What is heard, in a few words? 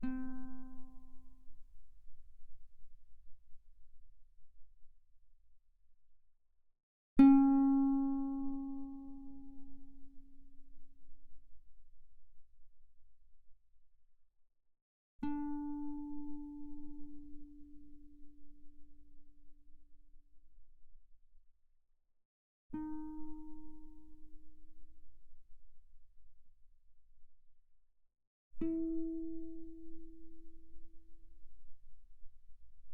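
Guitar pianissimo sul D C4 Ab4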